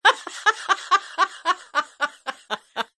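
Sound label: sounds of female laughter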